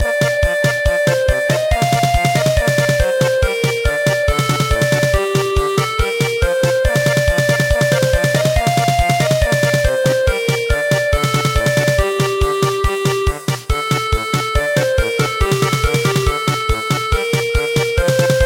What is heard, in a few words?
German Polka; Ukraine has not yet perished